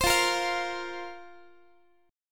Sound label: F Suspended 4th F, Guitar; Fsus4; strummed; x,x,15,15,14,16